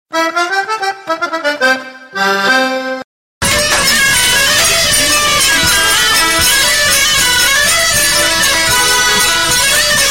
BOURREE CANTAL, france auvergne; La bourree du Cantal

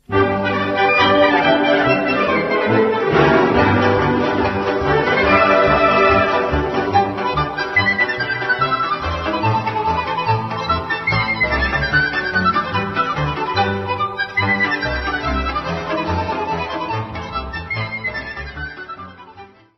Hora de Concert - Roumanie

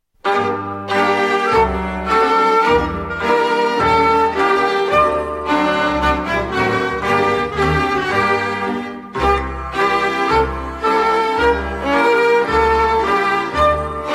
Verbunk, Hongrie

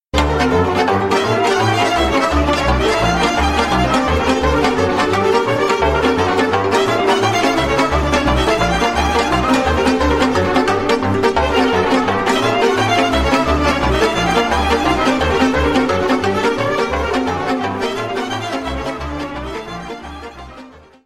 Sirba - Roumanie; Sirba din deal

